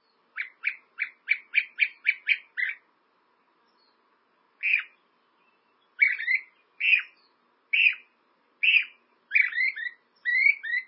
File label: Flycatcher; bird